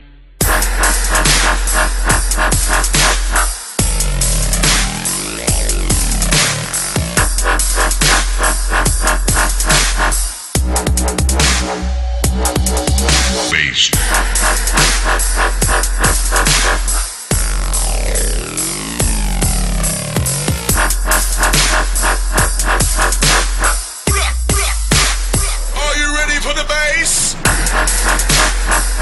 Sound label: evil dub